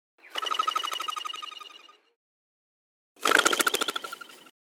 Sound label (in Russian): neskolko golubej uleteli iz pod nosa